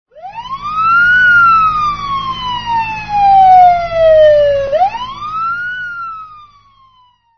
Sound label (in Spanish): ambulancia